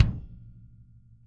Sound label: KICK